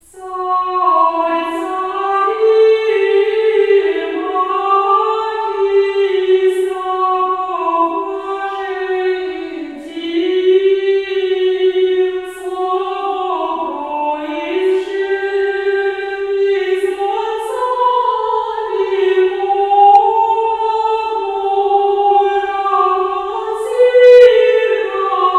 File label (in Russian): Пятидесятница ирмос 1 песни; Пятидесятница ирмос 1 песни 2016